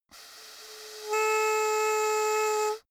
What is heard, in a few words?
hoot soft airy